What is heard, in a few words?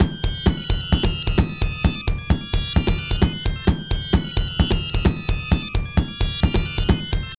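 drum loops, right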